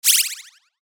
DESCEND WHIRL, Descend, High, Harsh, Digital, Static; Descending; Multimedia; Production Element, Imaging Element, Accent, Transition; Blastwave